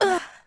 short effort; urbanchaos

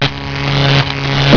Electric; FRACAS 1.2.1; Fracas Sounds

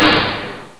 Elec; 3D Ultra Pinball Demo

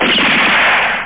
gunshot; Demon

